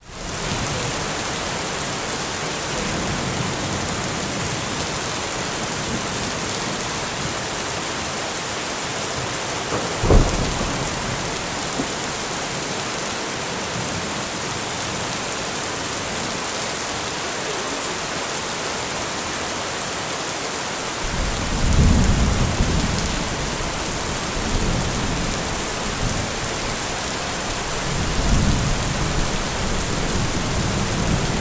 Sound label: Thunderstorm Steady; Weather; Lightning